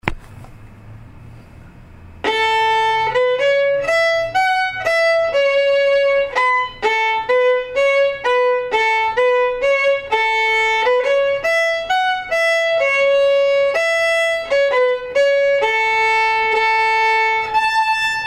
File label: Bonnie Tammy slow